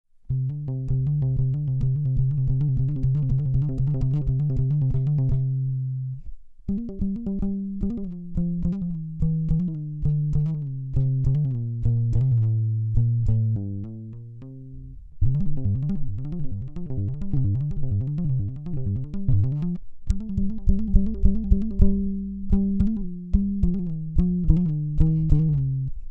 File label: Hammer Ons Pull Offs; Kala